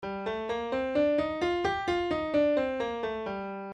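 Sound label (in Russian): Соль минор натуральный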